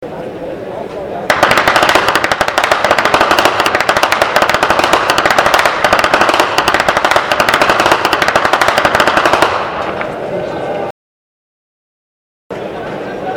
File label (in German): schnalzen